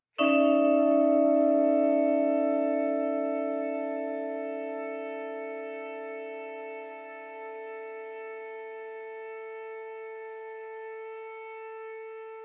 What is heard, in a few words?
for vibraphone and trumpet